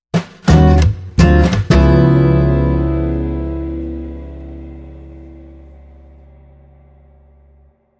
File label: Acoustic Jazzy